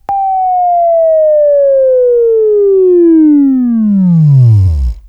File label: Buzz; Labview-Program; Sweeping Training program